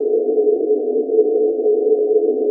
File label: wind